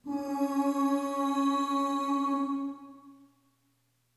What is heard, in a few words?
Ooh Short C#3 Short Reverb; Harmonic Building Blocks